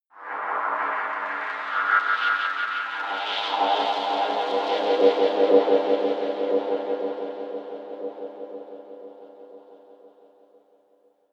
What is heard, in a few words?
scrapes grainy reverb, Drones; musikelemente